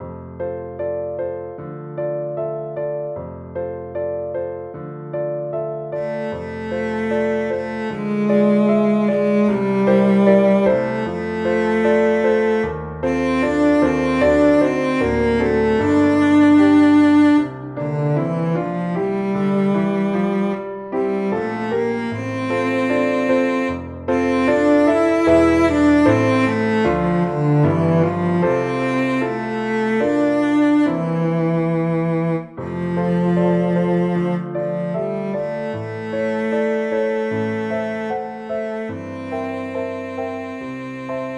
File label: cello synth; Cello, piano